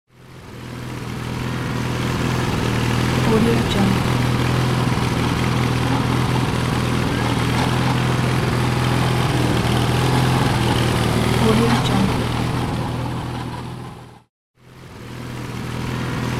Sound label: lawn mower pass by; davidbeardmusicproduction